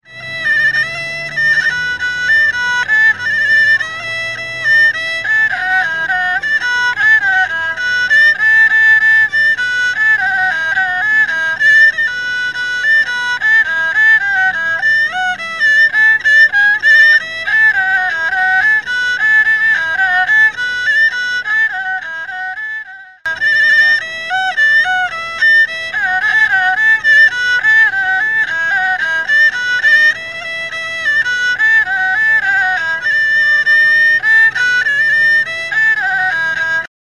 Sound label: thailand bangkok piphet